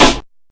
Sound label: focused steel snare drum sound g key, snare sound tuned to the G note; snare drum samples